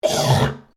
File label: MALE HACK; Male Vocal sounds; Sick; Human